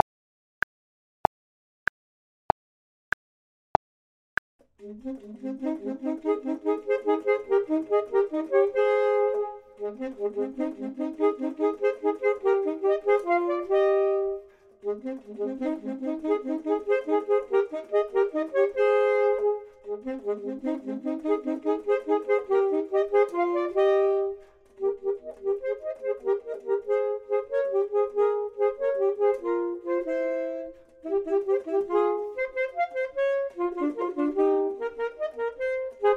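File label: both, Saxophone and Clarinet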